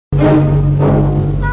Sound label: Tango